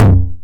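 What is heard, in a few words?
909 high tom tape saturation; Goldbaby Tape808 & Tape909; The Tape 909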